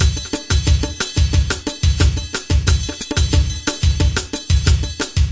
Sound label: general midi bossa, 090 bpm, drum loop